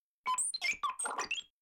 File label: Confused Beeps Carto, beeps & tones